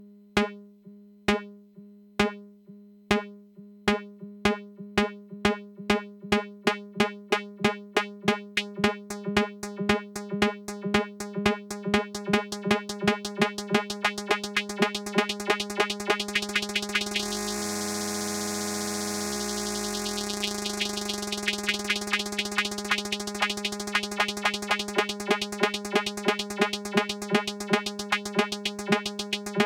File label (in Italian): cambio velocità lfo e timbre